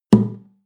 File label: western guitar drum single